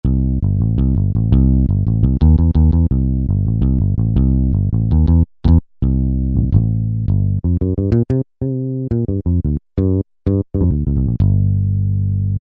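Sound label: Old Strings, bass, Yamaha EX5R; Factory presets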